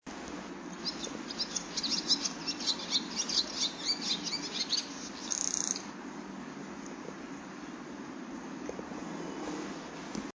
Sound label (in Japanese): tubame, tori